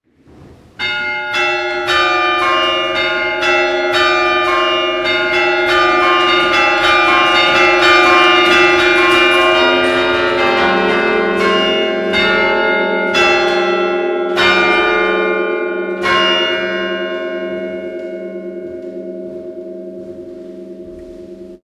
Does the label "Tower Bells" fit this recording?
Yes